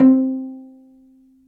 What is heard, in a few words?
Cello Solo Pizzicato; Ableton; Orchestral Strings